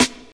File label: hip hop snare sound a sharp key